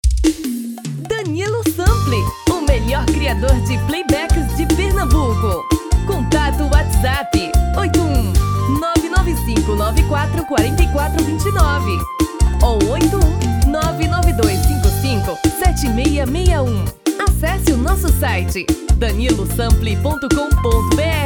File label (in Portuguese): BREGA